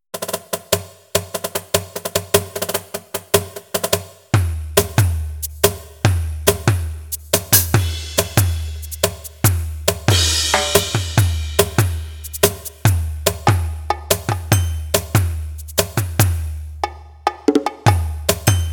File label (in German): gewa G5 Modul Perc Set